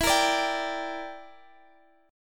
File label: E Minor 7th Flat 5th E, Guitar Open D